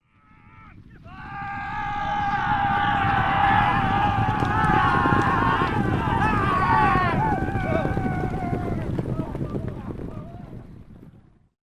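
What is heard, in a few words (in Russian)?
проносятся на лошадях мимо с криками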